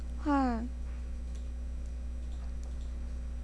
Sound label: horn